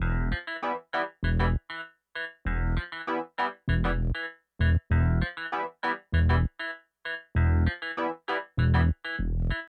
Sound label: 098 HipHop Bass Synth Combo A min; Digidesign; Pro Tools Essential Loops